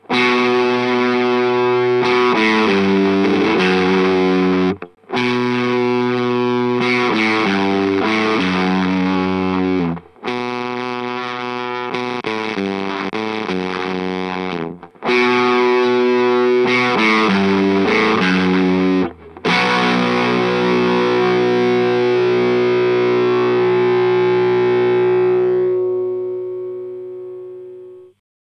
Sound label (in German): Octave Clang Trim Poti Settings; Death by Audio